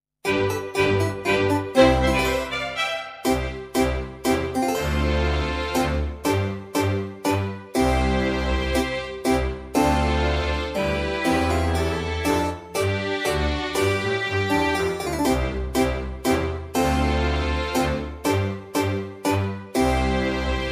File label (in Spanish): miniminuet sin flauta